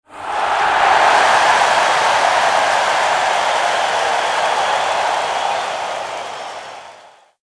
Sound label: big stadion applause very large